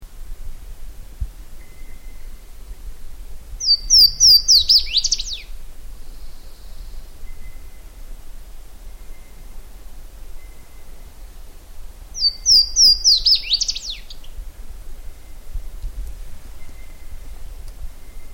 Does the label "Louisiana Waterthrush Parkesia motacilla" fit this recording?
Yes